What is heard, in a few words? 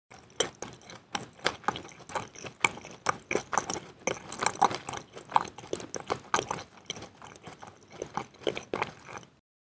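sound of chewing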